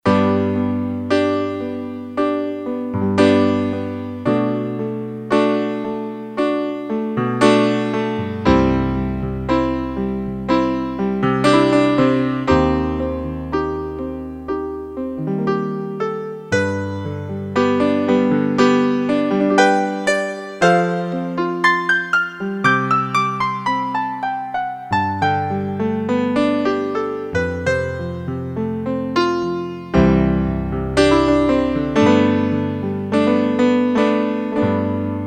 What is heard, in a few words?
G. Piano, Piano; Korg DSS-1